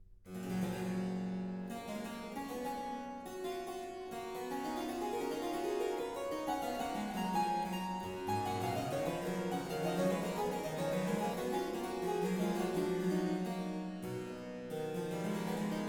Concerto III, en Sol Majeur, BWV 1048 - II. Adagio; JS Bach; Brandenburg Concertos; 바흐 브란덴부르크 협주곡